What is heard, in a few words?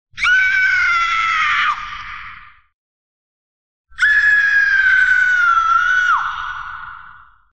screams; synchronica mobile manager